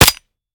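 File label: weapon fire last player mech, 1911; weapons; gmod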